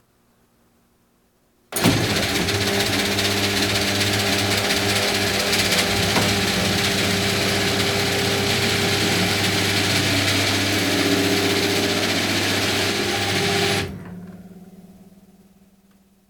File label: Electric garage door closes